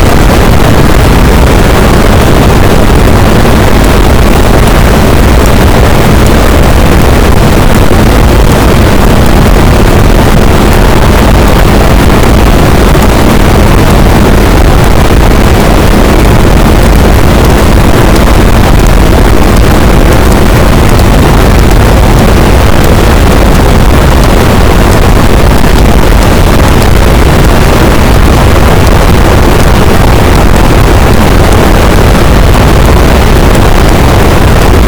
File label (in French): cachalot positif, boat, Physeter Macrocephalus